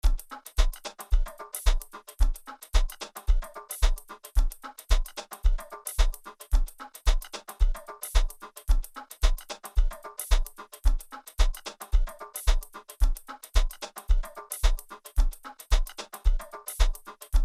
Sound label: Keys Heavy Stutter; J S Projects; Vyno